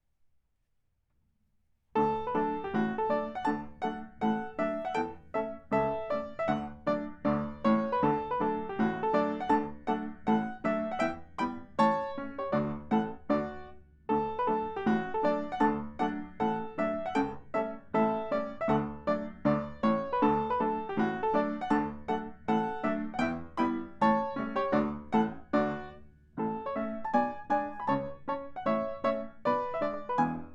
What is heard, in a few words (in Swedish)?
schottis; riddarhyttan